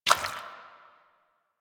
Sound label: Footstep; Warframe; Warframes; Pirate